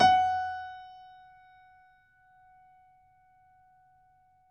piano; East West; The Ultimate Piano Collection

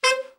ALT SRT, ALTSAX SHORT; Giga Samples Collection

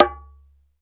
SURUBA, African perc; Greytsounds VOL-1

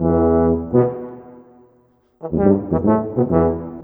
Trombone Tuba

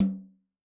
Brsh Jz Hi, Tom, Yamaha DTXpress-II

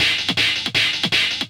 Loop, Drum & Bass, 160 BPM

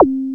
ZAP; Back In Time Records; Big Bang

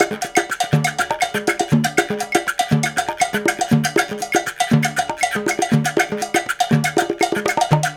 Gua Guanco; Spectrasonics - Supreme Beats - World Dance

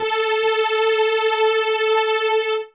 lead syn, Techno Trance

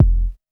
KICK deep, Techno Trance DRUMS

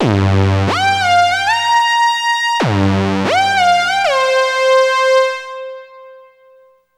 RIFF, Synth and Organ glide; InVision Interactive; Keith Emerson; The Most Dangerous Synth and Organ

